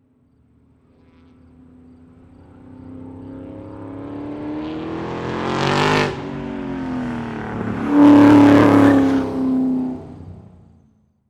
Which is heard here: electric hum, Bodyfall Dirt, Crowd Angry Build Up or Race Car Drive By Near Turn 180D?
Race Car Drive By Near Turn 180D